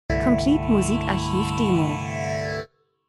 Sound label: transition; Transition & Movement - dunkel fliessend Maschine